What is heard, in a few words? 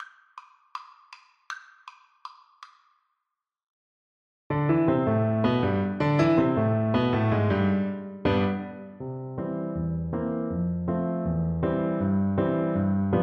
piano duet